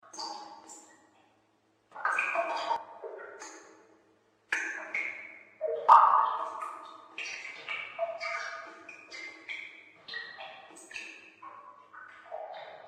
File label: underworld echoing drips